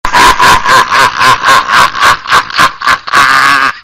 gülmesi; sonmez reyizin

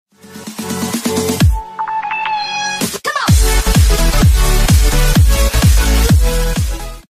intro muzigi

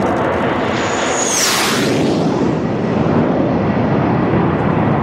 jet sesi

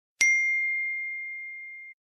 iphone zil sesi bildirim sesi

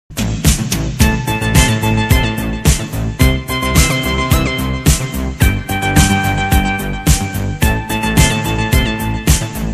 operasyon muzigi; nuri alco

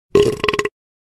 gegirme sesi

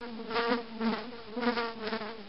sinek sesi

